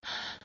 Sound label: Breath; Lewd SFX